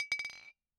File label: Bottle Struck